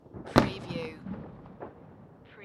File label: Fireworks Bang with a deep echo; beanstalk audio